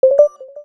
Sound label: REPORT WARNING; Samsung SGH-A257